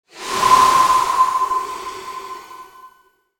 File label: Sci-fi Transition